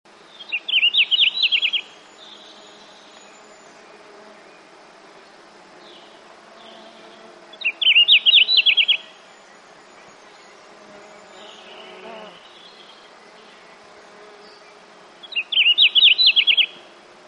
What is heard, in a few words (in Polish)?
modraczek, turdidae; wroblowe